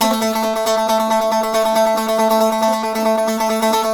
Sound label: SAZ; Spectrasonics; Hans Zimmer Guitars VOL-2